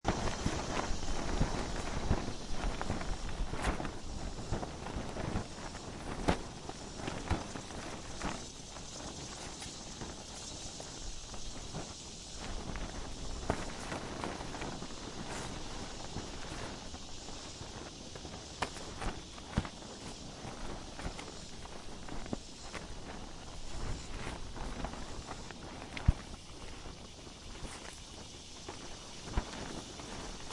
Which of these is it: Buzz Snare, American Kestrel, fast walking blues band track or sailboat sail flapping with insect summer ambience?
sailboat sail flapping with insect summer ambience